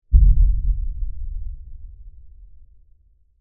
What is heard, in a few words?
Impact LFE Deep; Deep Tones; Sound Design; BlastWave